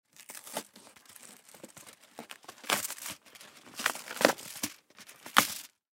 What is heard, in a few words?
Aluminum Foil Open Box; Metal (Sound Effects:Materials); Blastwave; Foley